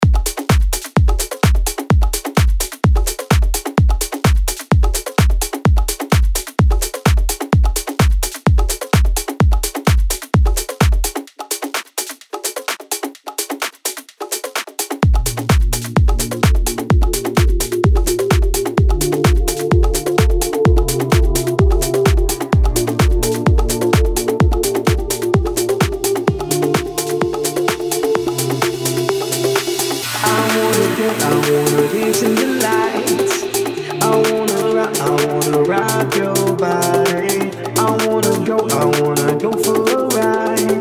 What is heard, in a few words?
TECH HOUSE